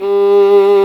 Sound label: STR SOLO, String; Roland - String Master Series; Chamber Grp1